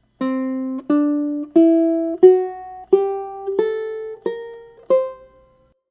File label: Lydian Dominant, ukulele